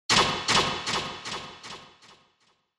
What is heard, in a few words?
Spotlight